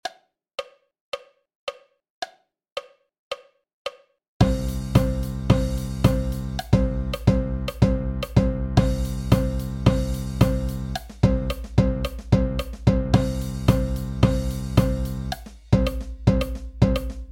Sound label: 110 BPM with countoff, Marching Percussion